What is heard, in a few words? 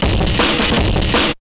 DRUMLOOP D+B 160, Drum+Bass Loop; eJay